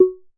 Electronic, notifications; Oppo Find X5

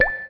raindrop

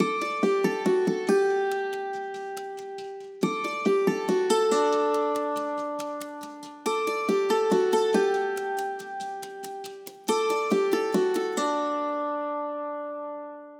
system ringtones; Amazon Fire-Phone

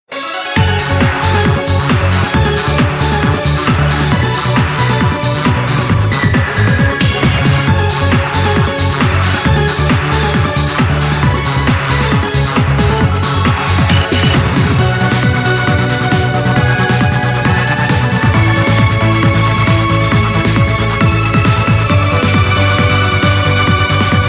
Euro dance

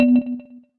electric sharp, keytone; SKY IM-A770K-VEGA-Racer

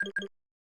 Low Battery; OnePlus One China

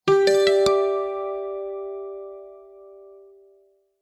Kantele

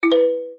Step, notifications; Huawei P30

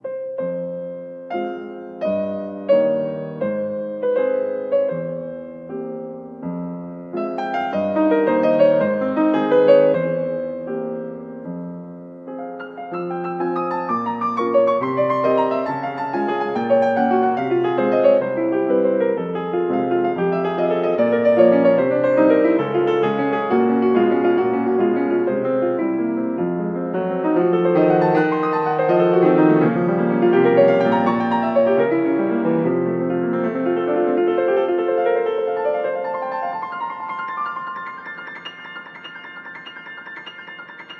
dreamy nights, Classical Harmonies, alarms; Google; Google Sounds

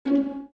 dial tone orchestra star; Oppo R7

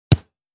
Kick

